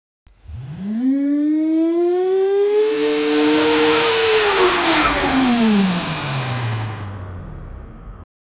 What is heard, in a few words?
a futuristic electric scooter